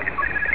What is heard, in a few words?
computer beep